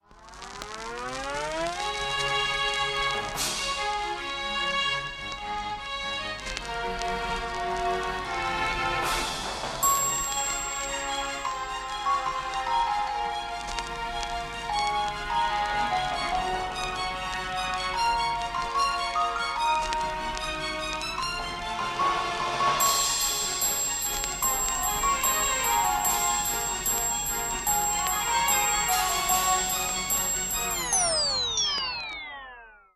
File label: Gramophone Classic